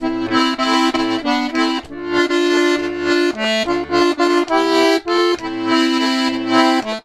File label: Complete Accordions; USB Soundscan vol.40; AKAI